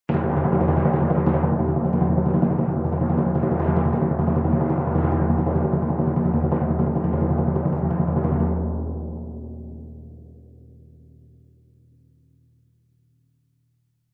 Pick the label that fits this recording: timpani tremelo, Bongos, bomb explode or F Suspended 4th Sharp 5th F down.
timpani tremelo